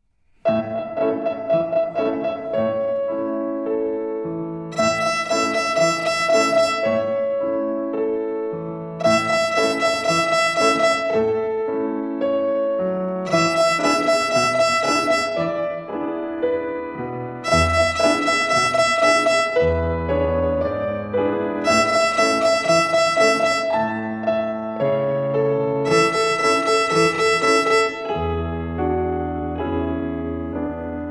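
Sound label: Rhythm on E Fifth Twinkle Rhythm, violin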